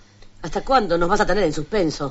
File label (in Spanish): suspenso